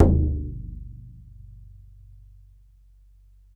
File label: BODHRAN, Ireland; E-MU Producer Series Vol. 7 – Old World Instruments